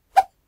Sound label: woosh; Kenney's Sound Pack; Foley Sounds